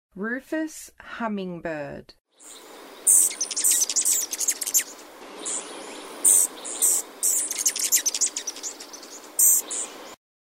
Rufous Hummingbird, Songs of Birds of North America; Western USA; Western USA Songbirds